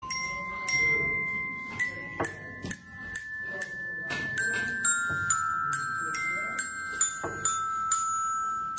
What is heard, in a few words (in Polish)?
sms